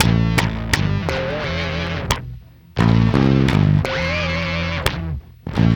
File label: BASS, Funky Ass Loops; East West